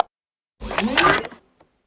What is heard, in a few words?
UI Fast Travel Lineage Close; Taps to Riches; android